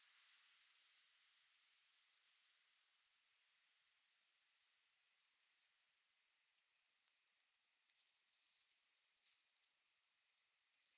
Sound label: Tsip, birds